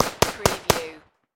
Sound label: Machine Gun AK-47